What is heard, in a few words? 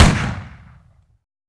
Shotgun Boosted; DoomRTX; Shotguns Boosted Intense